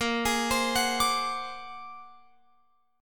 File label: A sharp Augmented 9th A sharp Guitar Open F; A#+9 arpeggiated; JGuitar